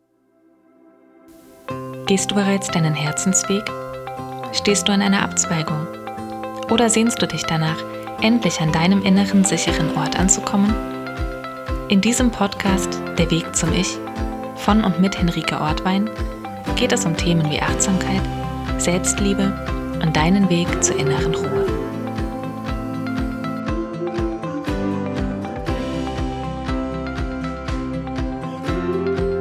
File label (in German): Podcast Intro lauter musik